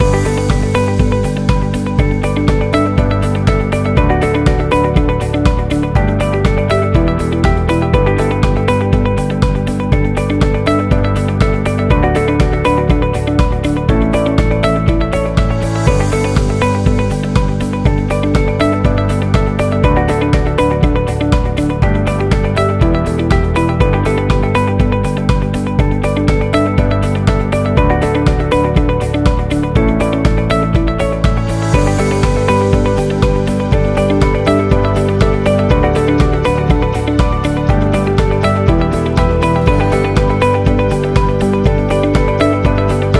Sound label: Happy Loop; technology